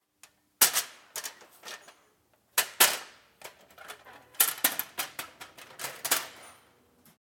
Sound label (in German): Leiter aufstellen